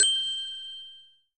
Inspire; LOOPERS

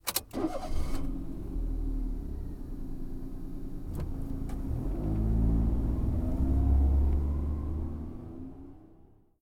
Minivan; LOOPERS

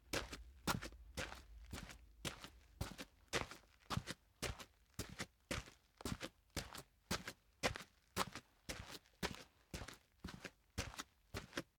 Footsteps Boots; LOOPERS

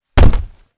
Hit The Ground; LOOPERS

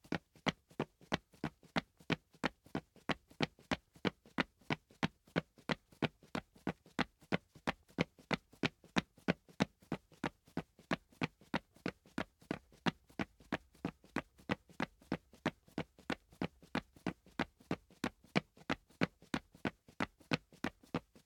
Footsteps; LOOPERS; Tyler

